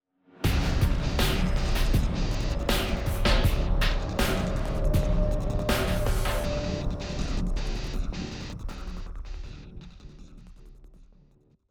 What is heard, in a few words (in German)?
Einbruch Akzent